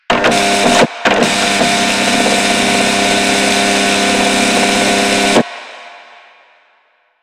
Office Fax Machine Misc; Ambience; Props; Warframe; Nineteen Ninety Nine

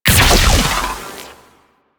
Shot; Warframe; Weapons; Corpus

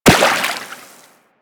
Run Water, Footsteps; Warframe; Operator

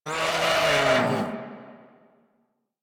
Catbrow Combat Vocal Death; Warframe

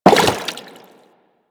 Crewman Step Water, Footsteps; Warframe; Corpus

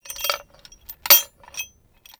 Smithy Metal; Ambience; Soul Plane

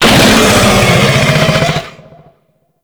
Infested Bone Blade Vocal Death; Warframe

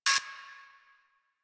camera stop move; Warframe; Veilbreaker; Quests; Kahl

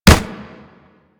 Kubrow Run Snow, Footsteps; Enemies; Warframe

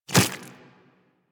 Infested Small Walk Default, Footsteps; Warframe

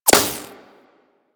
Laser Impact Metal; Warframe; Weapons

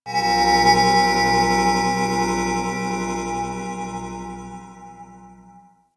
Temple Moving Bells; Ambience; Warframe; Corpus Ship Remaster

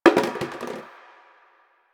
janitor cart plastic bottle drop

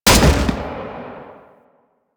Gun, Weapons; Jackal

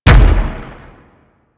Biped Step, Enemies; Warframe; Man In The Wall

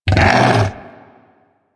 Vocal Death; Warframe; Hunting Critters; Undazoa

